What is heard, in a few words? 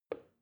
plastic button start release soft